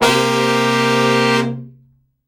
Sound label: SECTION 5, Horns; SECTION 7TH; Best Service; Killer Horns